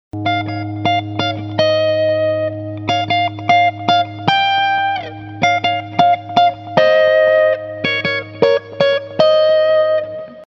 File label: 54 strat neck clean, pickups